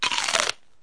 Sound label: biting celery; Sound FX Real Life